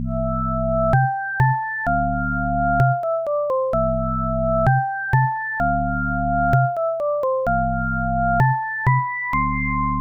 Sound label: Banjo Tune; Taninani iOS macOS Switch Windows gamerip 2020